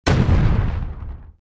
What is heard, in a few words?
Ink Boom; Fancy Pants World 1 Remix